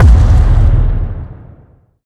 Footstep

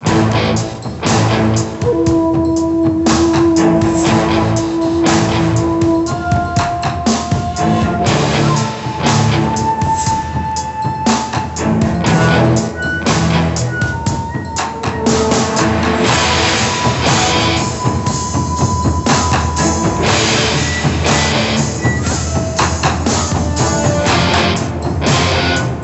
Music Mission Intro; generator rex agent of providence 2011 nds gamerip; Hong Kong

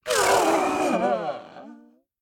Stagger; The Inn; Zara

